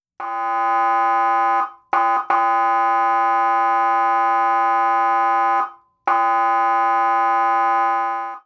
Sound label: air horn